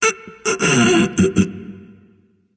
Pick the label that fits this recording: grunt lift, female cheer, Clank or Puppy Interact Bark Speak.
grunt lift